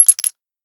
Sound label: dump, guns anaconda; cof